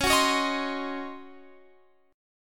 Db Major 7th Suspended 2nd Suspended 4th Db Guitar Open F; strummed